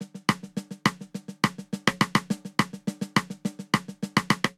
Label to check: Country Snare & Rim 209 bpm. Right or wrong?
Right